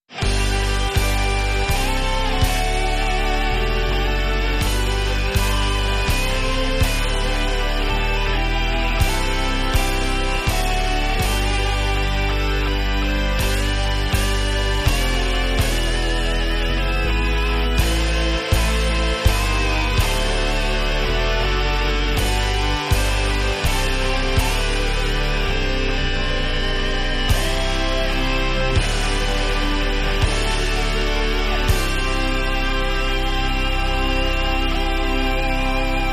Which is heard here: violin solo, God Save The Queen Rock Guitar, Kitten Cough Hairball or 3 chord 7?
God Save The Queen Rock Guitar